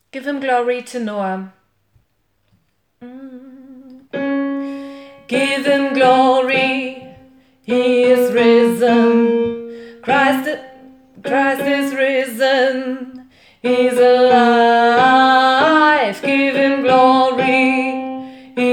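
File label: Give him glory tenor, gospel choir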